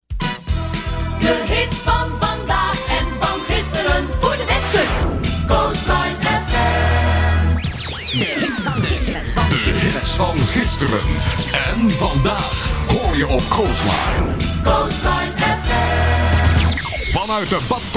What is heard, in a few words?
radio jingles jingle; Coastline fm